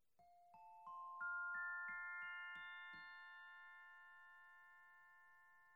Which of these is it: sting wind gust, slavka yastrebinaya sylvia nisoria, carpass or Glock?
Glock